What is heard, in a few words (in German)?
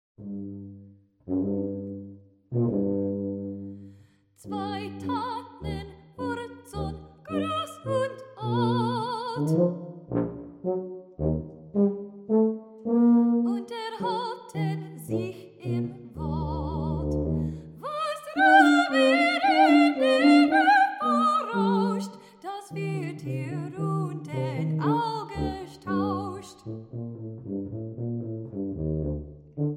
Galgenlieder für Sopran Stimme und Tuba